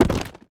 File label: dirt break